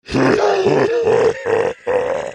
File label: hohhoh; Various Evil Sounds